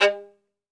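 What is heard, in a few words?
SOLO VIOLIN, Synclavier Strings; ILIO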